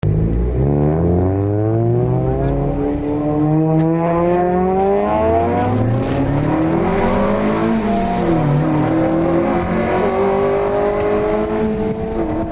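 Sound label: acceleration; race miata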